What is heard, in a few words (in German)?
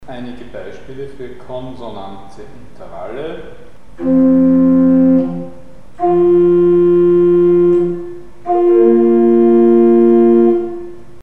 Konsonante Intervalle; Mikrobuch